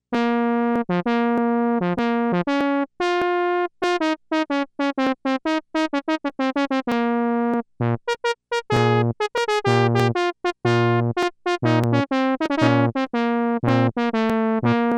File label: Trumpets